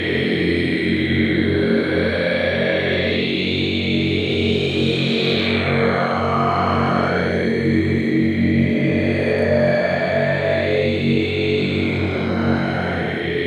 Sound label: low throat; kurkkulaulu